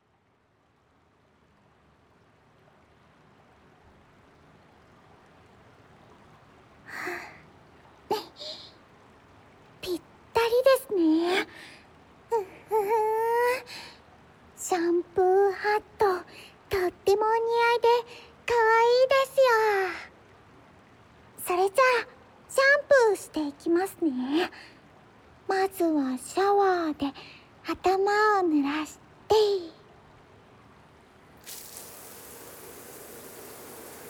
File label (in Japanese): 頭を洗って、シリコンブラシでのスカルプケア; ゆめぐり